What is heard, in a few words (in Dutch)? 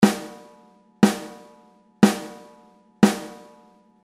Kwartnoot Snaredrum